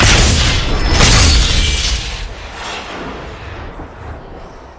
alter lock; LoL; altars

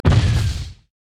tower; LoL

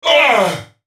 dying; Jayce Sentinel

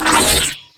essence flux; LoL; cyberezreal

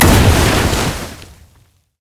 snowday qspellexplosion; LoL; ziggs snowday

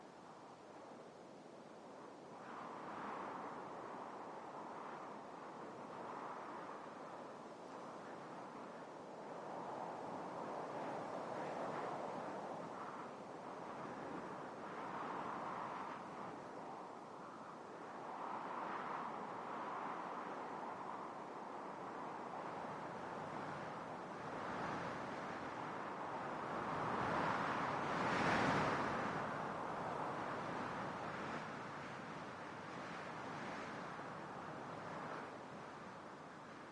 ambience; LoL

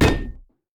yeti vs metal; nunu

